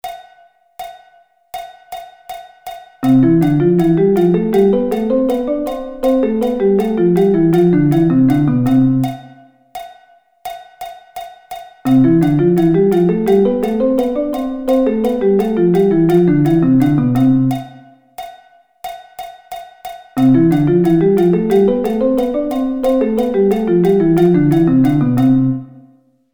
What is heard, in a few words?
C Thirds